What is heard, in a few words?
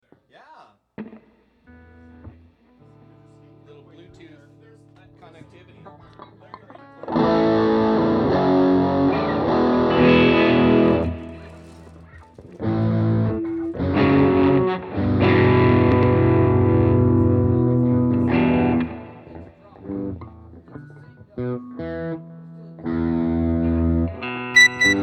Guitars Mix